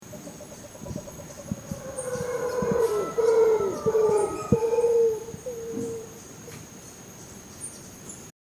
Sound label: Howler call begins